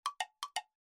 Knock; Cricket Ovation

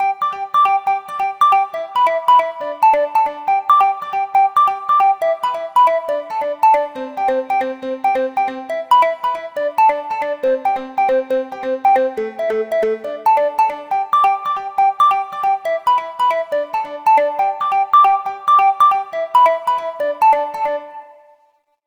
Rush, ringtones; LG L-Fino

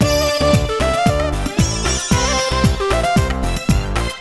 World Bollywood; Google; NewWave Labs

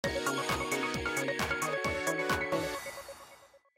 Eurodance, message

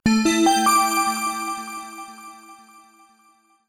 suspense, notifications; ZTE Grand-S-2

